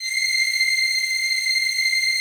PAD, ATMO PADS; Best Service; XX Large

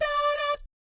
"DAH DAT", vocal jazz fx

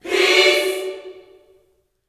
PEACE chord, Gospel; Spectrasonics; Vocal Planet Vol.1 Gospel; akai

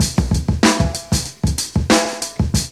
LOOP; E-Lab; X Static Goldmine 2; akai

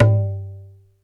PERC

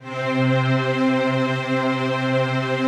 PAD, Pads; Best Service; XX Large

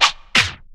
SCRAT; E-Lab; X Static Goldmine 2; akai

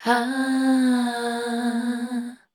MEL C, Voice Spectral II, MELODIC; Best Service

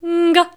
F4, Voice

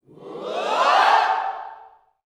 SWHOOPS, Gospel, YELL SHOUT; Spectrasonics; Vocal Planet Vol.1 Gospel; akai

